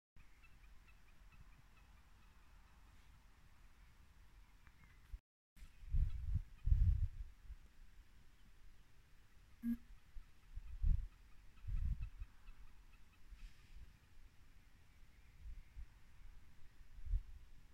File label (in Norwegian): fuglelyd